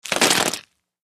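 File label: INT: Crushing ribs, Ribs Crush Bone Crunch; IMPACTS & CRASHES - FIGHTS RIBS: INT: Crushing ribs, crunching bone.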